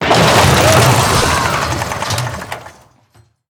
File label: Camouflaged Dirigible Death